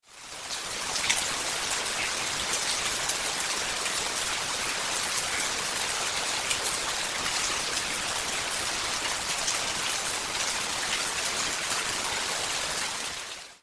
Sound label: rain